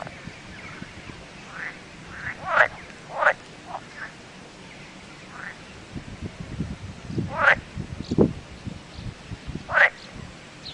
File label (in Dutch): kikker